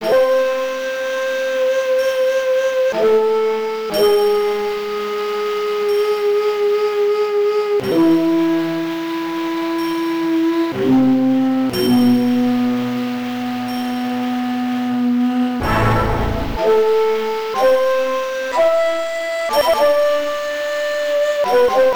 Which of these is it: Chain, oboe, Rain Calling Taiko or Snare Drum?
Rain Calling Taiko